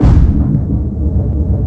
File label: SFX - Ricochet Bomb; Twisted Metal 2